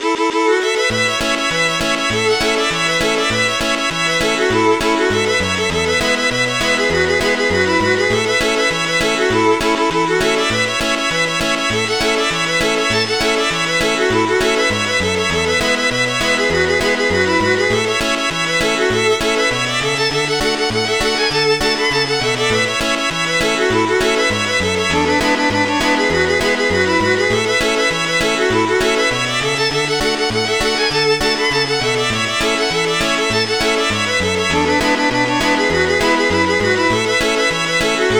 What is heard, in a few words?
CAJUN, Oldies